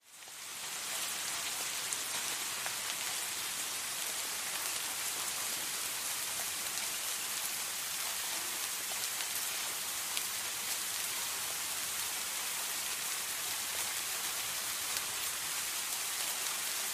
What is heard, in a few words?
drizzle, rain